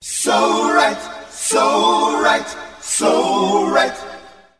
so right so right so right SUNG; Voice

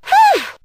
huh, Voice

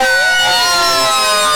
siren, Vehicles

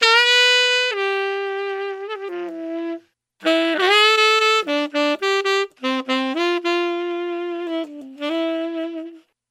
sax wistful, Sad; Loops

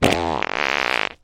fart long

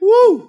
"whew"; Voice; ooh ahh; human

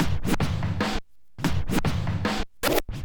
Vinyl, Percussion

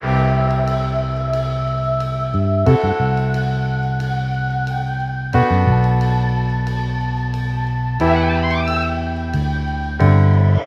keys, phonky loops

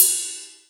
Hats Real Open

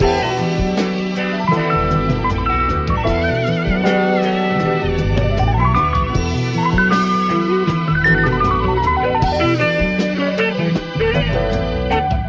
keys and guitar; Phonky

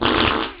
fart medium; Farts

